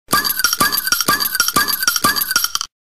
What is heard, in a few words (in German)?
Flensburger Plop